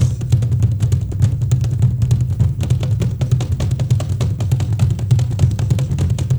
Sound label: ZULUS, ZULU DRUM; Spectrasonics; Heart of Africa 2